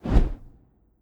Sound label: Hawk Flap